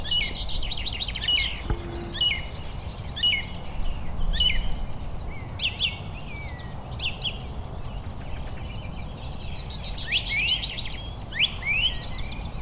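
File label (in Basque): birigarroa, Zorzal común, Turdus philomelos, Canto; Carbonero común / Parus major; Observatorio de aves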